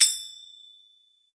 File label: nipon cymbal; ETHNIC and WORLD PERCUSSION